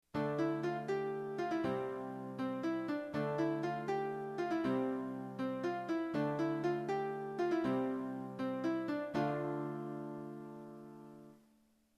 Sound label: lute; ultima